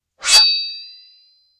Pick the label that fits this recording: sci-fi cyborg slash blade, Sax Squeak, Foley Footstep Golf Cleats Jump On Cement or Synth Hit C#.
sci-fi cyborg slash blade